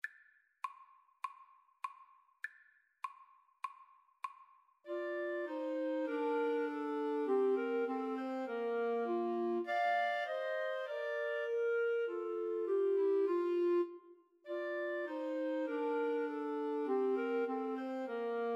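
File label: schumann melody 3 clarinet karaoke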